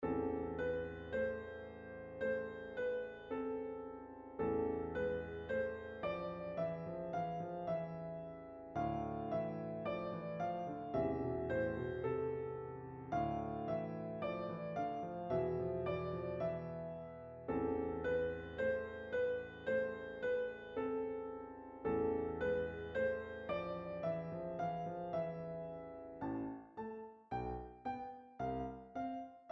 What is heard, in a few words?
Halloween Duet